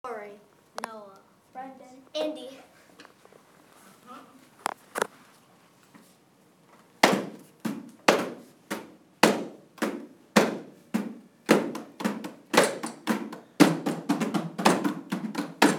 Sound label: found instruments